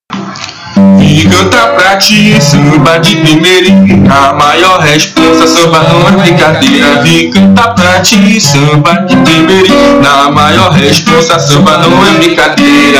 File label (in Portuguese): samba